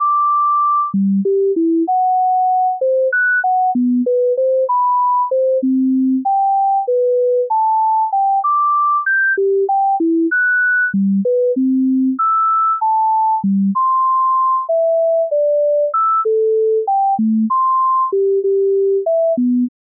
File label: lofi